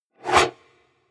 wipe

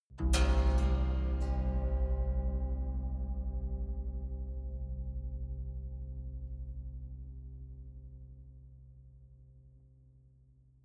haunted strings dark omen soft

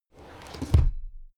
drawer cabinet crammed close regular halfway

